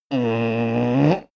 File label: male grumble strain start; menschen; koerpergeraeusche; soundpool